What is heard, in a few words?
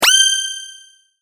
sword